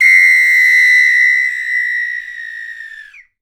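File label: samba whistle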